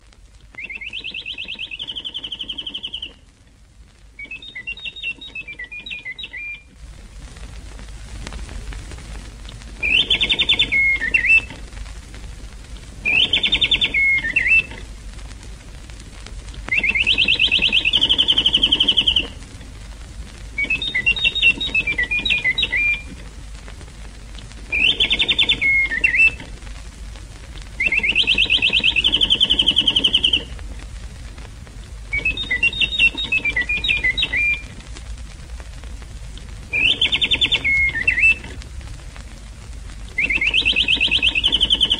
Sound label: Uccellino della Radio interval signal; RAI